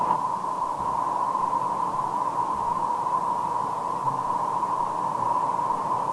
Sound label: wind